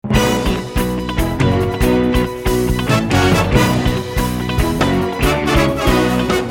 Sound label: music, PCM 44 Khz, 16bit, stereo (CD kvaliteta); Prilično kvalitetan i dobar zapis